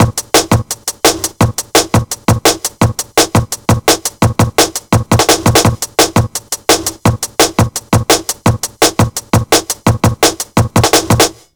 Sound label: 170 break, Explosive Jungle; USB Soundscan vol.05; AKAI